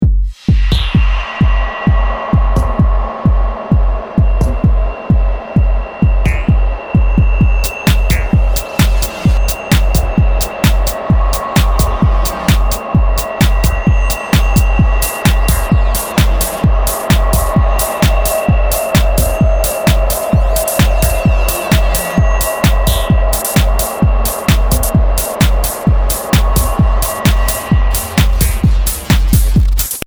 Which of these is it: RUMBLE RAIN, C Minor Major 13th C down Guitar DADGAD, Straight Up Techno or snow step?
Straight Up Techno